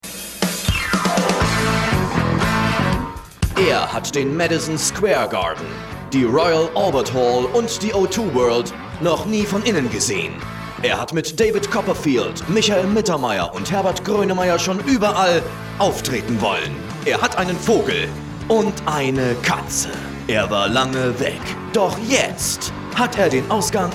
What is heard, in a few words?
intro jingle; Ansage für Auftritte in Deutschland